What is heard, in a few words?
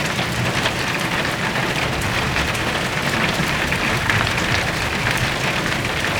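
ambient rain roof heavy; Call of Duty Modern Warfare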